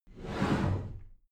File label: cupboard slide door slide heavy